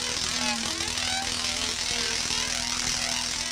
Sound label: taser loop; techies